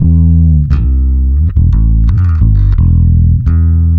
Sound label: bass vari; steinberg cubase 5 variaudio